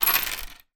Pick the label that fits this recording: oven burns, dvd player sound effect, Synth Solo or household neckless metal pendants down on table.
household neckless metal pendants down on table